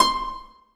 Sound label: harp c6; Sonatina Symphonic Orchestra